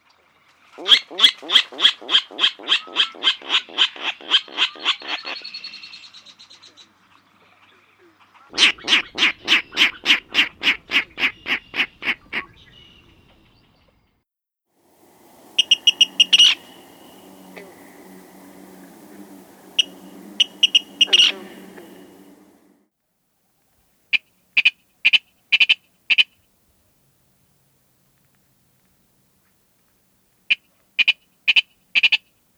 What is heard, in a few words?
Virginia Rail